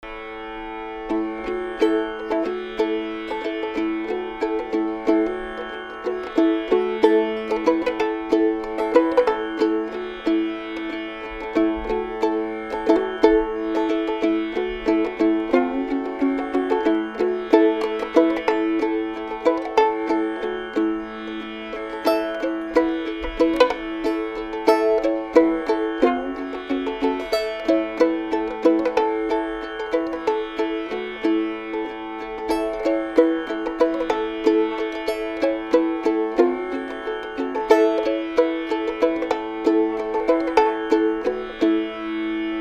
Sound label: strumming